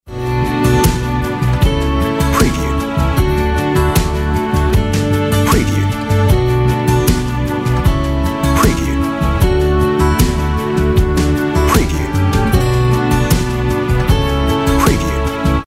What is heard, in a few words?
On Hold Music